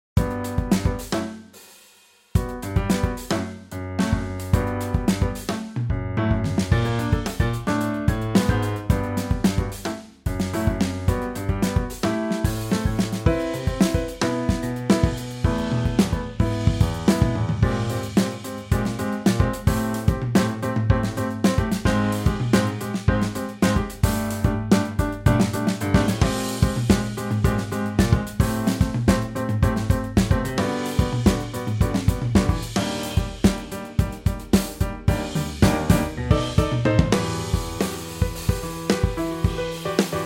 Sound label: cymbal tam